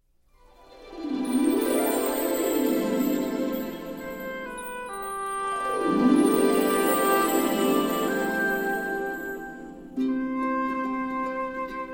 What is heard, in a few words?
Orchestral